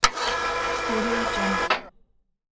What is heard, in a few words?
winch